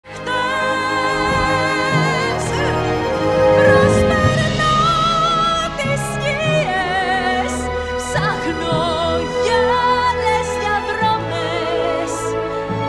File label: singing; Frozen II